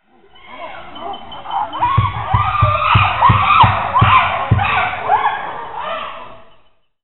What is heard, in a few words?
Chimps, Dschungel; ElephantListeningProject; 50 Mikrofone im Dschungel; Artenvorkommen